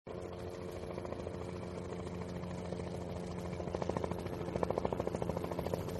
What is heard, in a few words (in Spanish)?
helicoptero meme sound